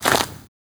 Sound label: walk gravel